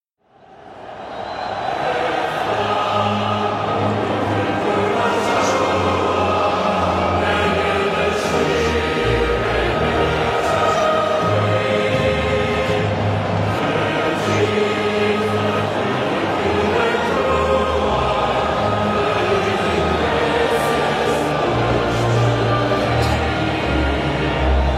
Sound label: Albanian National Anthem; EURO 2016